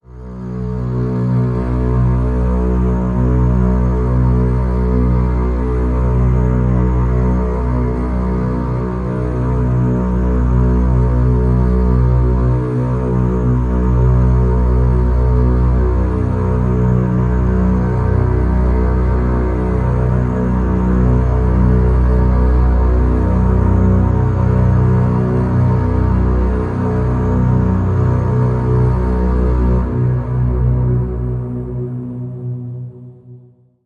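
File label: drone; Rosin B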